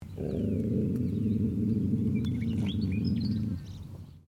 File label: ANIMAL DOG BLUE HEALER GROWL; Various domestic dogs; Animals